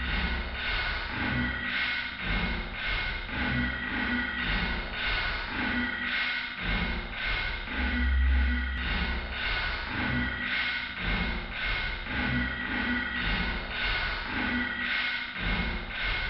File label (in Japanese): ループ「機械音」; 音師竹内